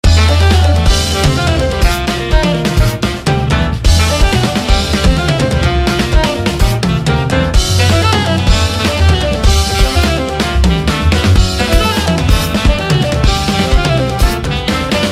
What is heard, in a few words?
intro music; ff2; zanki